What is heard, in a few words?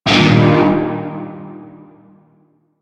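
Shawzin Pentatonic Minor Chord J; Music; Warframe; Narmer Shawzin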